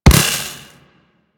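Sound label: Web Projectile Land; Warframe; Corpus; Arachnoid Wraith; Abilities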